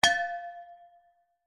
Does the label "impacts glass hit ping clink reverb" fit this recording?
Yes